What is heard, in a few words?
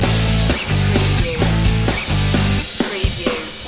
hey you loop second chord crashes